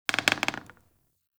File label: Vendor Wood Floor Creak; Ambience; Soul Plane